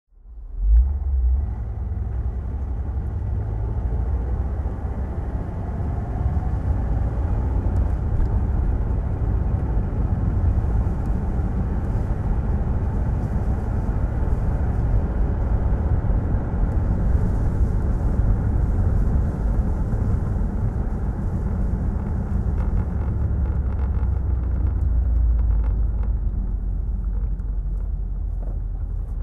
rolls royce corniche driving, engine sound driving; Rolls Royce Corniche, 1982; V3D/ ClassicarImages; Marc Vorgers